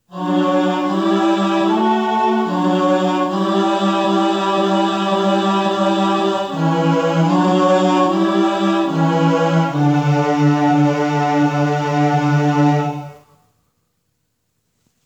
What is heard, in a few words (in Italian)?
alleluia iniziale bassi